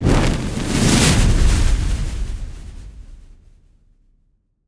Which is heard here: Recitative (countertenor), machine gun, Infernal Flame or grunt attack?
Infernal Flame